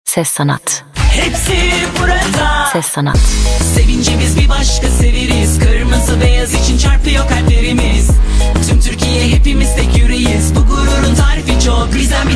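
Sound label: vocal, seslendirme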